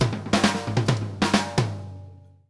Drumworks SP fills 132; Sampleheads; New York City Drumworks VOL-1

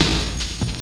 SD, Dark Side Of TripHop

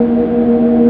GTR DRONE ALT; PlatinumPhattCD1; E-MU Producer Series Vol. 8 – Platinum Phatt; Mo Phatt Instrmts

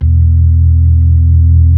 B-3 Club Xfade, Keyboards of The 60's and 70's

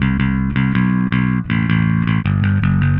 Happy Pub Bass Riff; Sonic Foundry (Sony Creative Software); Indie Rock Anthems; Session 05 - Happy Pub

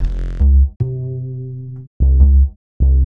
Bass, Futurist Drum & Bass

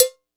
kit, Yamaha Motif-ES8

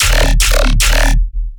bass loop 150 D#; chonker; Virtual Riot

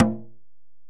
Ac Perc, Drum Machines Alesis DM-PRO

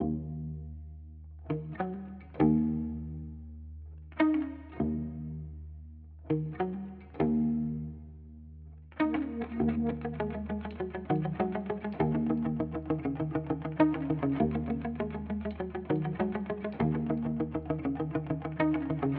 Guitar Loop, alternative; it's not bizkit; 99Sounds x Monosounds; Instrument Loops; Guitars

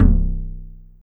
RSS PERC

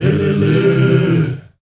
MALE HUM; Spectrasonics; Heart of Africa

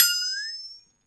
Metal, Percussive FX; musicradar; cartoon caper

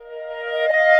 Clarinet Effect; Sonic Foundry (Sony Creative Software); Jazz Solos and Sections; Effected

